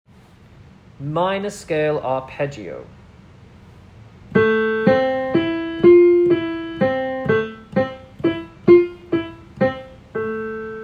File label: Minor Scale Arpeggio